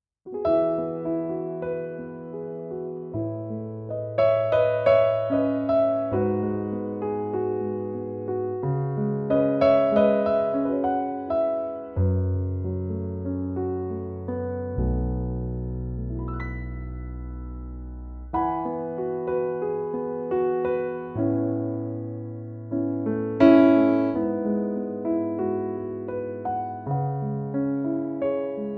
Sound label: piano E; key - E - vocal range - B to A